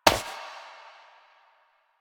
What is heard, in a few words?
Step Flesh; Warframe; Koumei